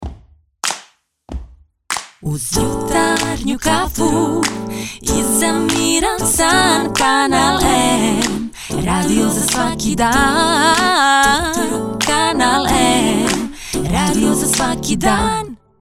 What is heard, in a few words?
ID jingle